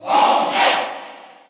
Cheer Russian; Bowser; SSBU

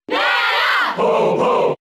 Cheer English; Ice Climbers; SSBB

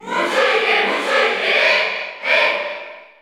Cheer Spanish; Ike; SSBU

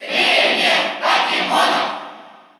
Cheer Russian; Pokémon Trainer; SSBU

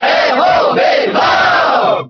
Cheer French; R.O.B.; SSBB

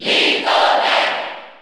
Cheer Italian; Little Mac; SSB4; SSBU

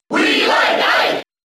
Cheer English; Ike; SSBB

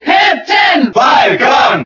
Cheer German; Captain Falcon; SSBB

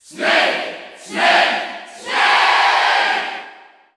Cheer French; Snake; SSBU

